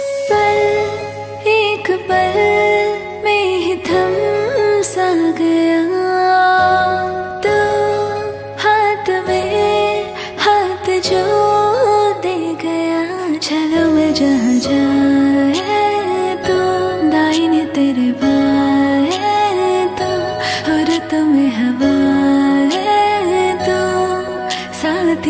Female Version Song Ringtone; ringtones

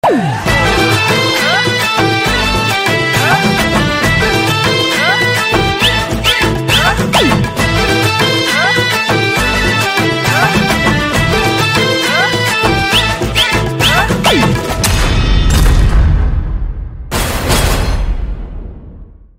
Instrumental Song Ringtone

